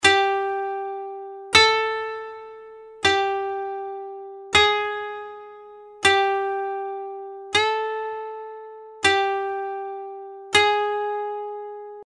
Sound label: koto